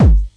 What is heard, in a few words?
overdriven kick; Kicks; single hits